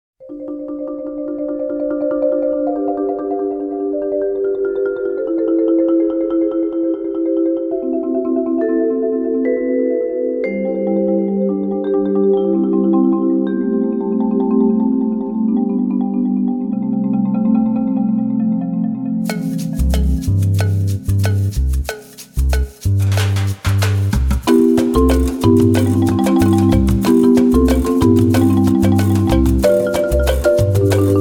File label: Double Drums Stille Nacht